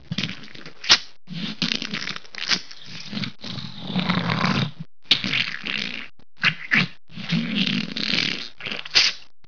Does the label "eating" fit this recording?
Yes